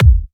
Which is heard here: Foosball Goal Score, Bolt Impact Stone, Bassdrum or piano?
Bassdrum